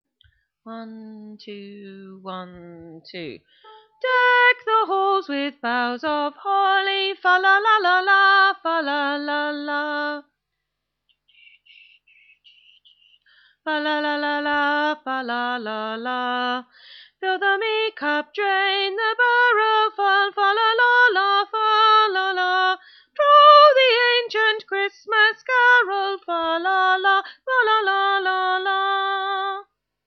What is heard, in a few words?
Alto